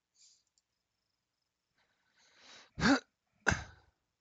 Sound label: jump; GameplaySequenceDemo